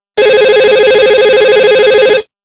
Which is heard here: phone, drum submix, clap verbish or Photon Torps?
phone